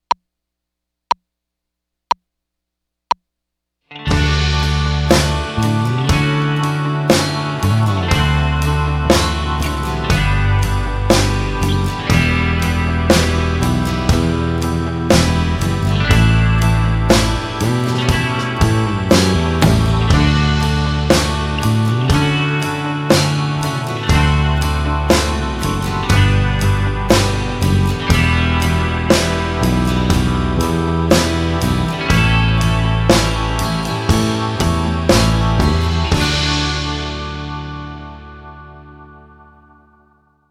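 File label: Arpeggiation Without Guitar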